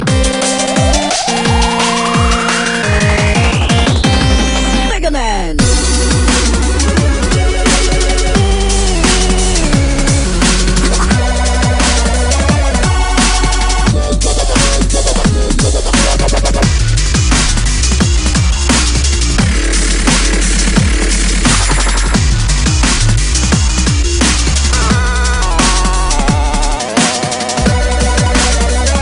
dubstep, ringtones; megaman; electronic

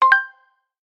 Simple notification

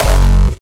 Kick

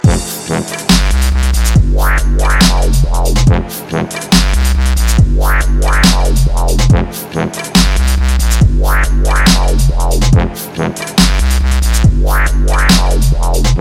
dubstep; electronic

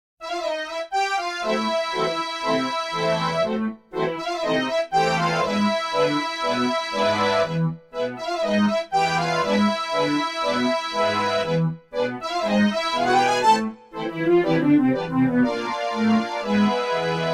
tango; children

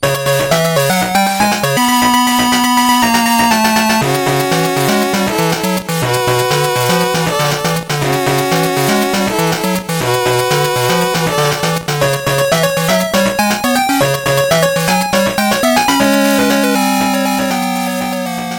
8 Bit Theme

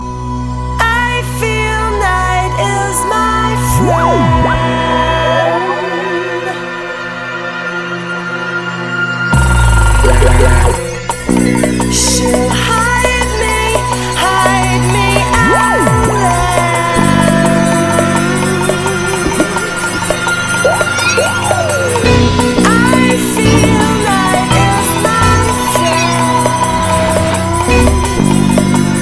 breakbeat

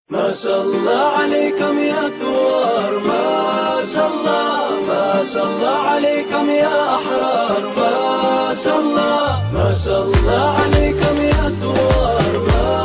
libyan music, ringtone

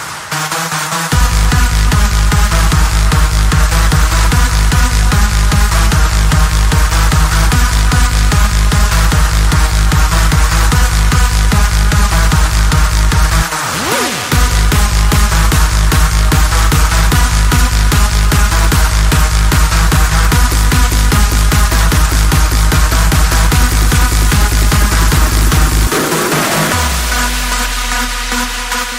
Mellow

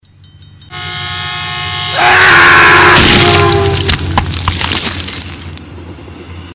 Train Splat

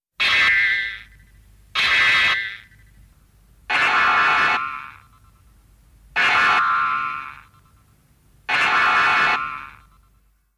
sound Jet

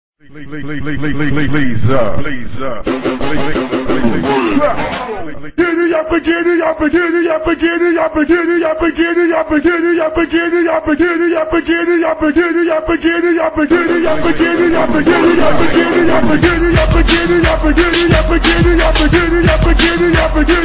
slowed down

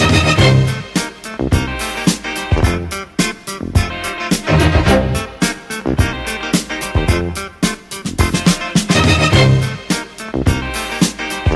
70s Disco

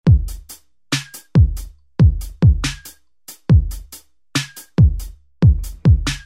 my love super melody

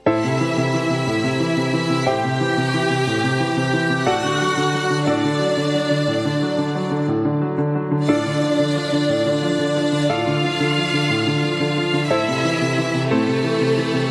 Violin; Sherlock

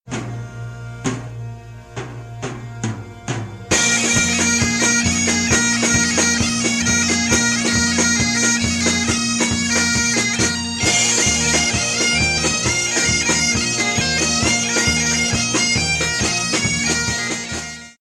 Pipe Orgie

